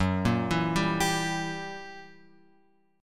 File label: F sharp 7th Flat 9th F sharp; F#7b9 Chord {2 1 2 0 X 3} arpeggiated